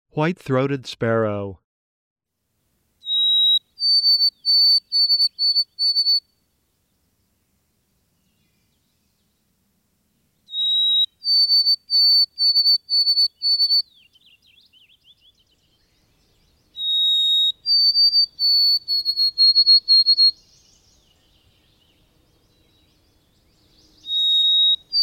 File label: white throated sparrow